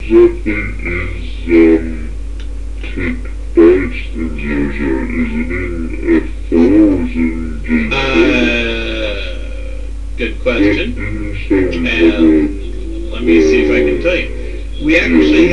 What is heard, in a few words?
didge